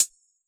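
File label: T9 hi-hat Closed, Yamaha DJX; 155 DJX Kit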